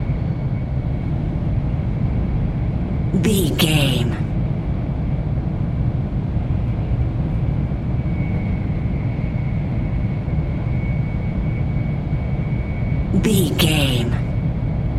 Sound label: background room interior hum loop